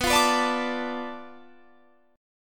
B Minor 7th Flat 5th B

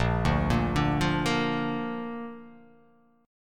A sharp Major

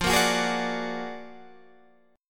Gb Augmented Major 9th, Guitar

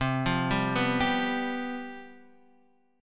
C Major 7th Suspended 4th, down, Standard; arpeggiated

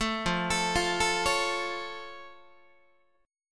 F Augmented F Guitar; arpeggiated

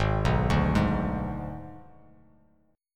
G sharp Diminished, Bass Standard; arpeggiated; 4,2,0,1

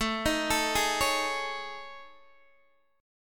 A Major 7th Flat 5th, Guitar, DADGAD

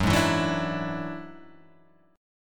F sharp 7th Suspended 2nd Sharp 5th; F#7sus2#5 strummed

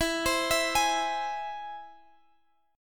E Augmented E down Guitar Standard; arpeggiated; capo=9

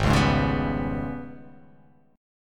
G sharp Minor Major 7th Double Flat 5th

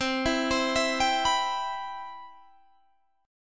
C Major 7th, Guitar Standard; CM7 arpeggiated; JGuitar

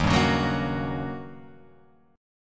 Eb 7th Sharp 9th, Eb, Guitar, Open F; Eb7#9 strummed; 3,2,1,1,1,1; JGuitar

